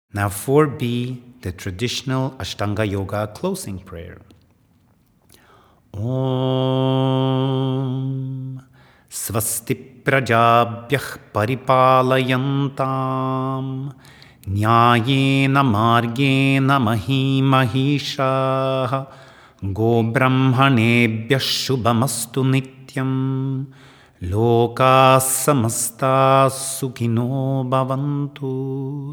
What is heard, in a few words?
Ashtanga Closing Prayer